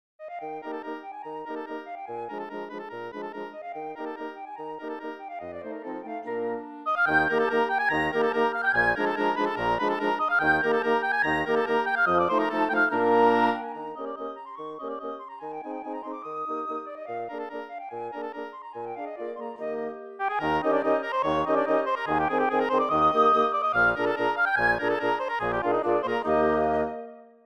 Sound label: 5tet, midi; Weber